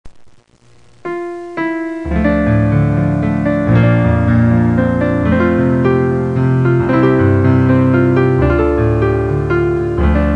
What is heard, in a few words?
Waltz